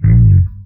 Bass A#1; 音MAD; 孤独摇滚

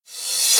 Dr reverse

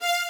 violin

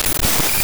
noise purge

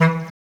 Trumpet E3; 音MAD; 转生成为了只有乙女游戏破灭Flag的邪恶大小姐

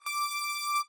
lead

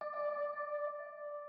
organ

